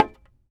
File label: TAR; Roland - LA Composer vol. 3